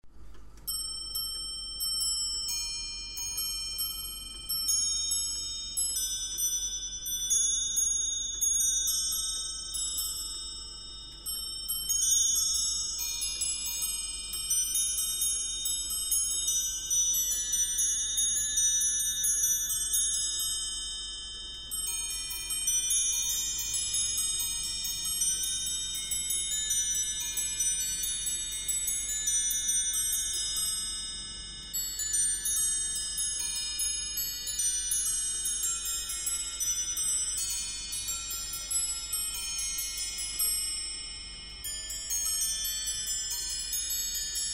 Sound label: carillon